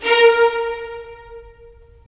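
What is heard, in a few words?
strings violins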